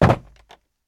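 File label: bridge to land; firefight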